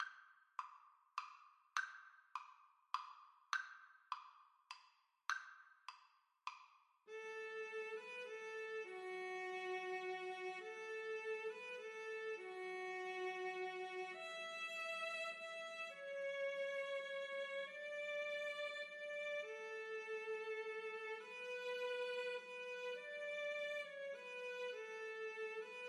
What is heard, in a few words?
Violin Duet